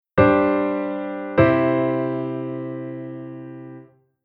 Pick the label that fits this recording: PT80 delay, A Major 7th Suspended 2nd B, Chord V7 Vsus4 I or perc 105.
Chord V7 Vsus4 I